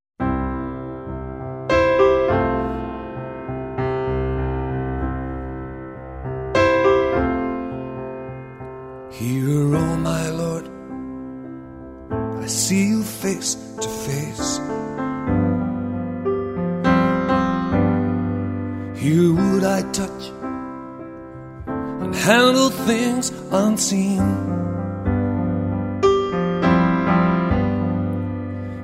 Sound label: Piano